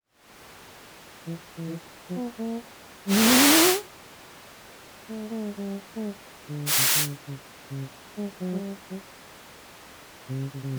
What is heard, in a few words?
classroom before